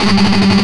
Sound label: Riff, Industrial; Nasty